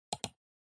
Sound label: PC Mouse L